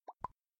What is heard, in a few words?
bubble